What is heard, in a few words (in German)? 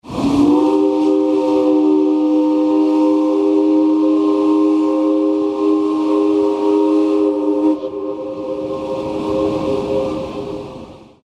Dampfersignal